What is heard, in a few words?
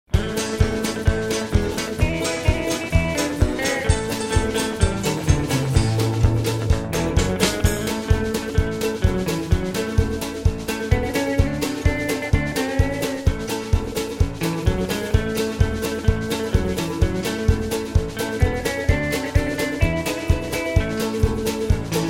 music